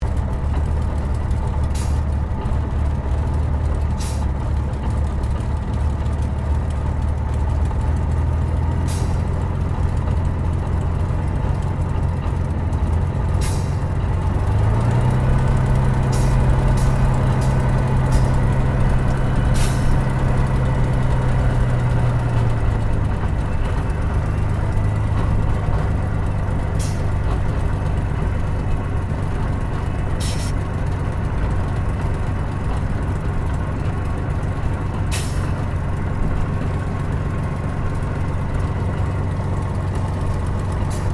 AIRCRAFT SUPERMARINE SPITFIRE MK-VIII SHUTDOWN INTERIOR; Airplanes; Airplane Sound Effects